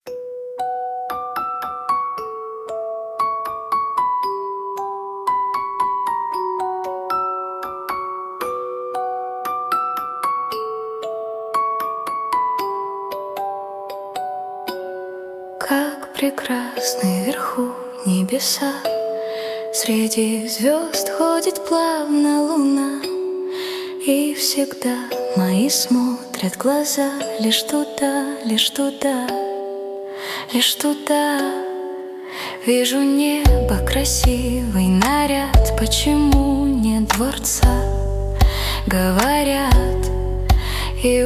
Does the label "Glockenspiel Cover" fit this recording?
Yes